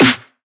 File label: clap & snare